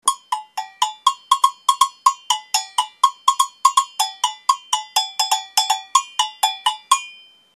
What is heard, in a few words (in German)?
Agogo 3er